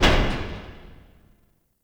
Wisp Door Stop; Ambience; Props; Warframe; Tau; Circles Of Hell

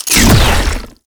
Shot; Weapons; Warframe; Tenno; Ink Rifle

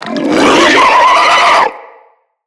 Infested Ancient Attack; Enemies; Puss Ancient

